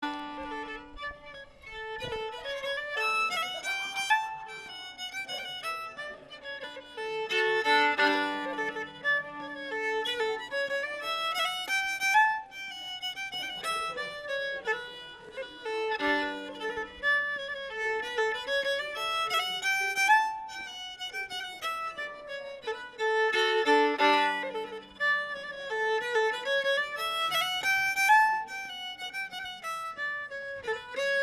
Polska i Smålandstradition; endast melodi

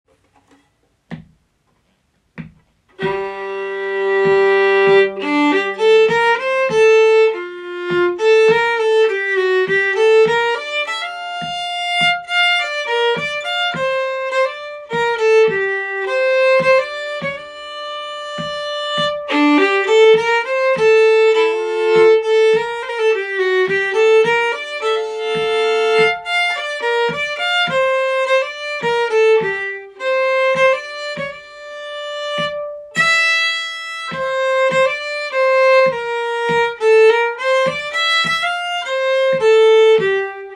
motmelodi; Begrundan

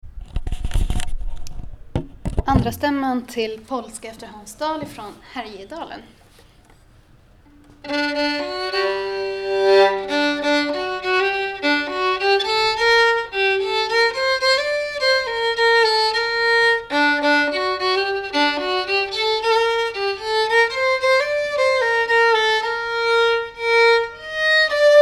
Polska efter Hans Dahl stämma

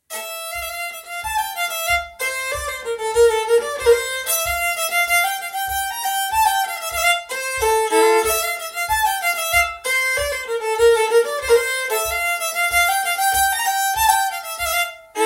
Slangpolska melodi; ur sexdrega

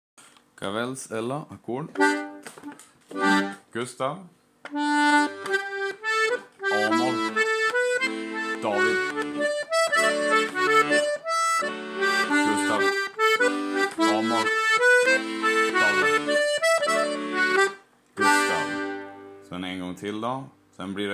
komp

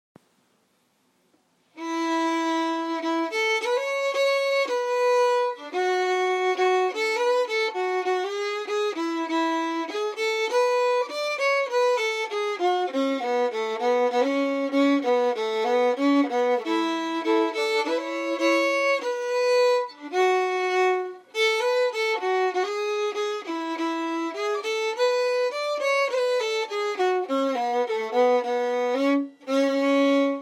stämma; Snöstormen

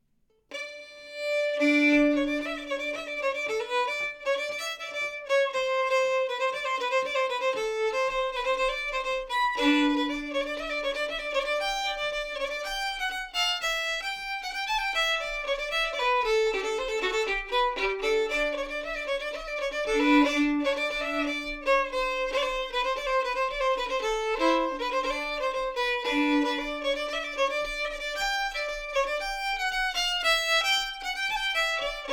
polka; Fjallvinden

